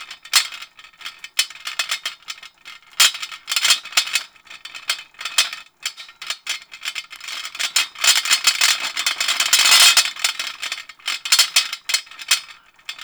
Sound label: Gas City Rattle Metal Loop; Ambience; Props; Warframe; Corpus Gas City; Props Remaster